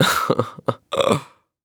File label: Human Laugh To Self Male